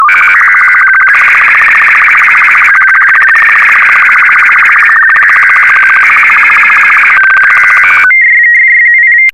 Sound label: sstv; R8BW